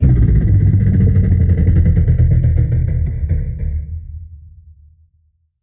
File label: Crest Ride Power Down